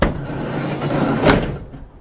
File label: Heli doors